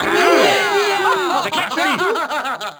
foul, Crowd German

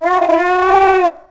Call, Dino; Ourano

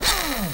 menu slide; Freestyle Street Soccer; XBOX

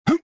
jump

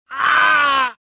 pain; jedi

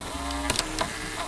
Robot Oneshot; BedRoom

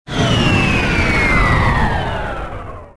cold room off

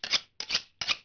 knife, TOWN; Pirates Of The Caribbean; AMBIENT

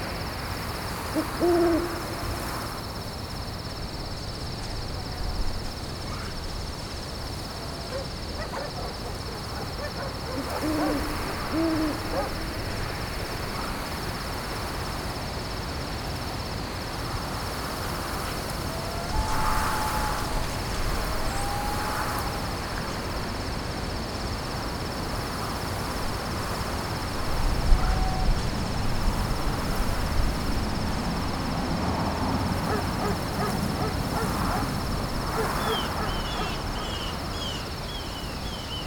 Amb Forest; Pilot Down Behind Enemy Lines

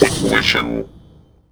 coin; Pump It Up Exceed

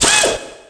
gui removeblock; Tony Hawk's Project 8; XBOX

